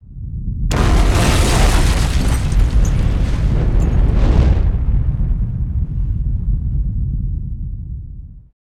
Planet Logo Explosion; Blasto; PSX

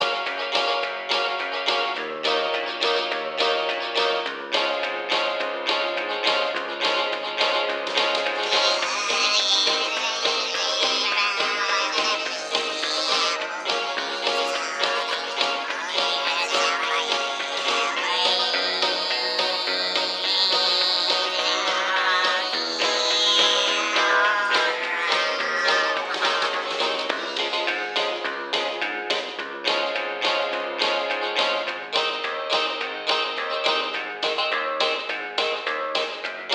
Jukebox Country

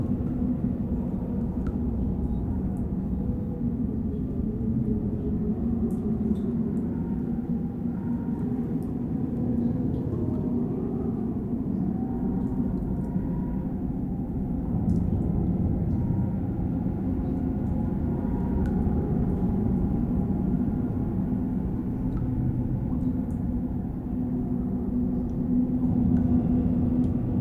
Cave Enviro Loop

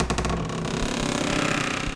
door creak; ProSoundCollection v1 3 MONO

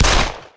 fire 9mm

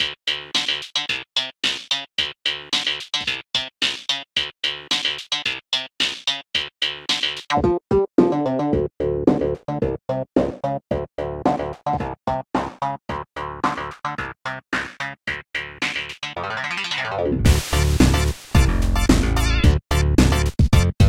Funky Space

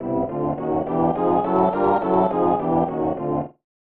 Power Shot Meter

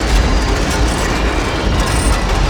Door Large Metal Loop; Lucius III

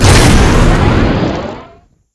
Drift Boost; multiplayer kart racing; boom karts; android

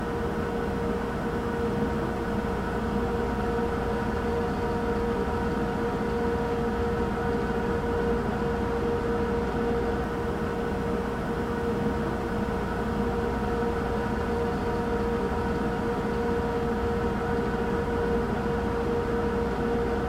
Ambient Decontamination Hall; Among Us

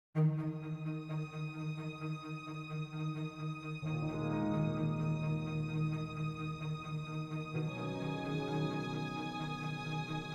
World Music

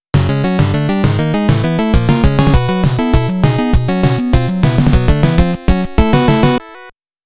Game Over BGM; konami famicom chronicle vol.3 rom cassette edition

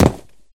footstep concrete land; MONO; ProSoundCollection v1 3

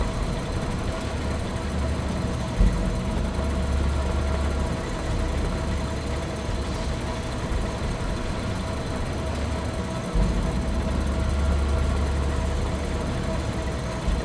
conveyor belt loop; Poppy Playtime

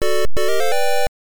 Challenging Stage Start; MSX; Galaga

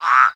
Monster Frog type Death

